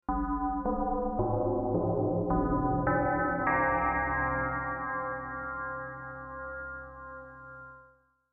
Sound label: synth; YAMAHA PLG100-DX; Дочерняя звуковая карта